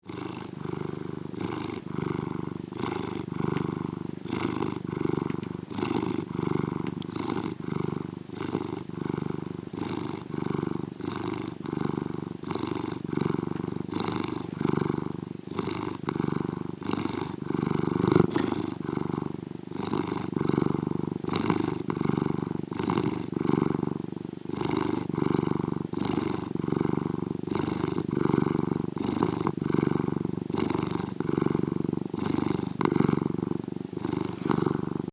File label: CAT PURR